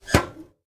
Panel Electrical Wires Close; Among Us

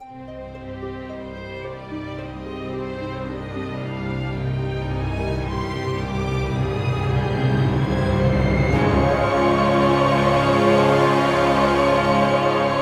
String; Assassin's Creed Unity Dead Kings

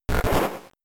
Sleep Powder; Sleep Powder part 2; pokemon; gen 3; attack moves

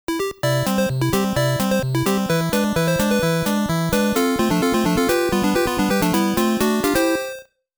Victory Stand BGM; Game Sound Museum Famicom; Excitebike; VS. Excitebike

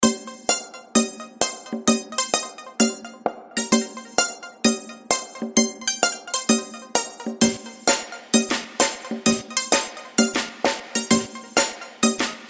Floor 2 Music; Bloodungeon

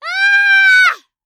Child Scream; don t be afraid the first toy

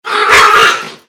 Ghost Attack; don t be afraid the first toy

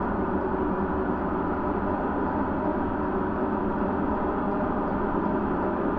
Combat Drone Noise

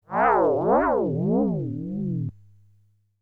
Player Decelerates; Dragon Breed

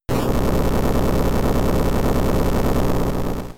Earthquake

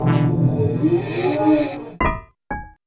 Fail; l. o. l. lack of love 2000 dreamcast gamerip

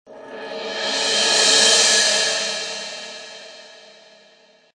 crash swell; eden

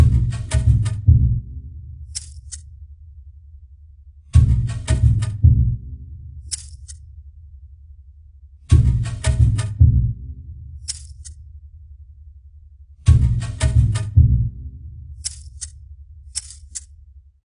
Jungle Level

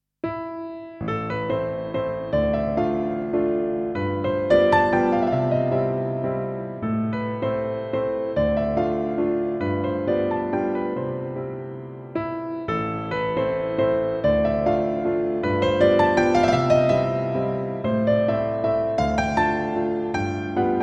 valse a-moll; EVE New Generation X

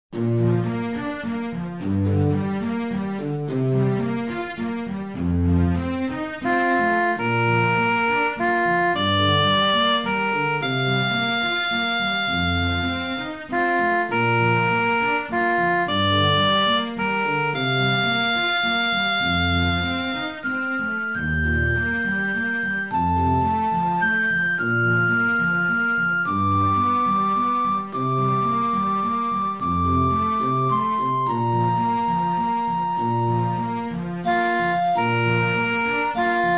Orchestra Version; Legend of Zelda: The Ocarina of Time

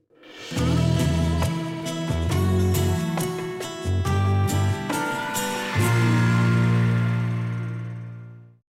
Fort Ghost Intro

